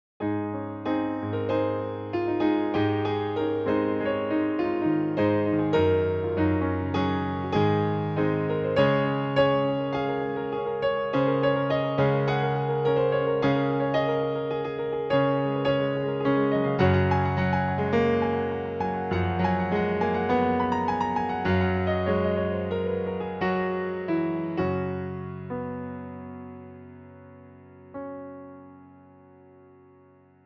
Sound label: String Ensemble Ab to Bm